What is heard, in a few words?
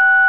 TONE; webphone